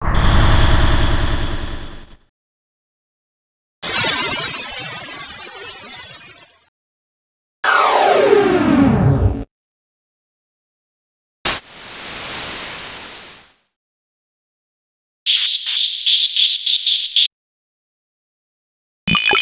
Industry FX; X-Static Goldmine 5

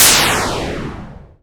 hit, IMPACTS & HITS; Techno Trance Essentials

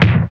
BD, BD Dance; Sound & Vision; Gigapack I CD 1

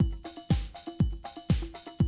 Classic Drum Loops

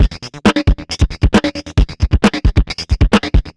fx, Beat Loops, 134; Zero-G; Monster Beats

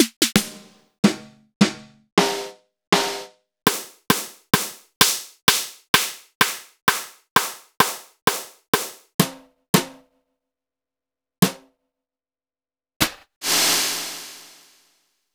Roland BK-5 Snares

